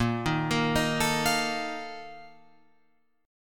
A sharp Major 7th Suspended 4th, Guitar Open F; arpeggiated

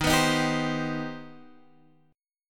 C Minor Major 7th D sharp

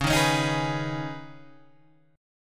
Db 7th Suspended 2nd Db, down, Guitar Open G

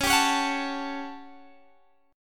A 13th Db, guitar chord

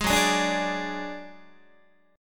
F sharp Suspended 2nd Sharp 5th, Guitar; strummed; JGuitar

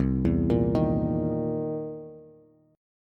C 7th Sharp 9th C Bass; arpeggiated; JGuitar

